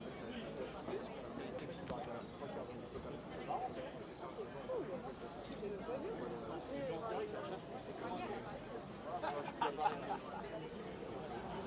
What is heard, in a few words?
Terrasse Hotel De Ville